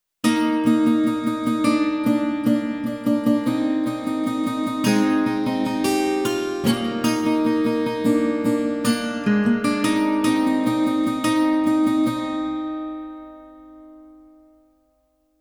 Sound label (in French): Generique Guitare